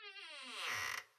creak; Farm

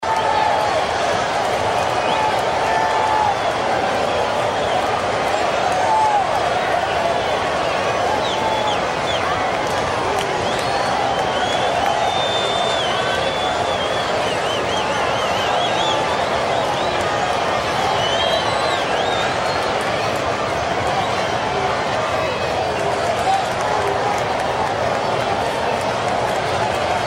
crowd

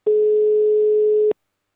dial, call, mobile

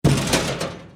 trash metal

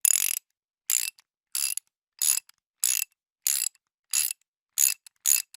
wheel, Mechanic

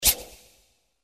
rotate

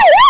siren amb

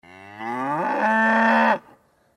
cow; Farm

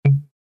delete basic

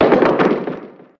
dice end

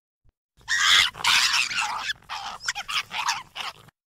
fox, hunting

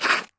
blader, Iceskate

